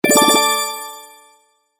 ブロックが消える モノラル

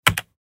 キーボード タイピング音 単音

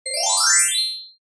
キラリン 長

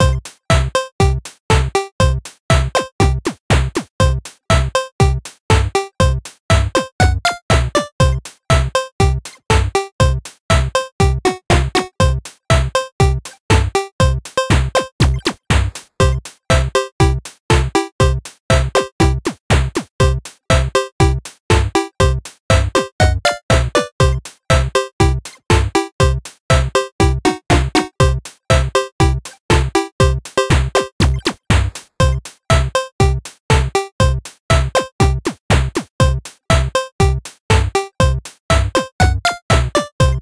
チップチューン intro 120BPM

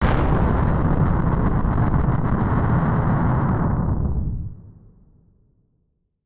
爆発

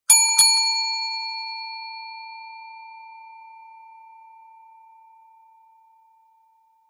呼び鈴 二連続

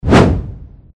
こん棒を振る; モノラル; 武器効果音

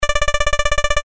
会話

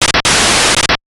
ノイズ

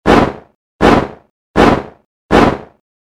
モンスターの羽ばたき 4回 速め モノラル

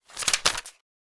札の音; ボードゲームやカジノ、麻雀の効果音です。

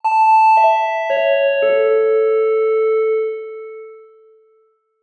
お知らせ 終わり 遅め リバーブ有り; 戏剧音效

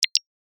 メッセージ

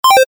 キャンセル

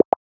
メッセージ

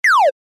撃つ レトロ

